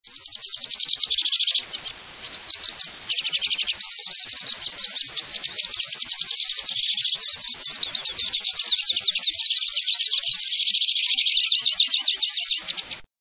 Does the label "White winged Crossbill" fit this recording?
Yes